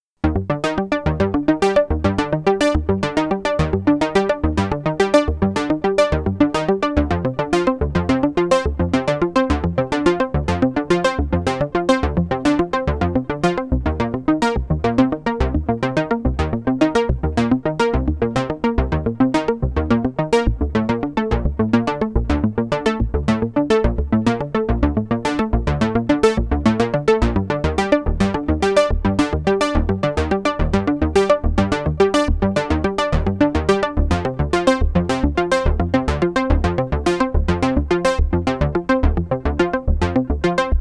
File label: Juno 60 Arpeggio